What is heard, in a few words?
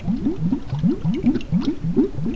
underwater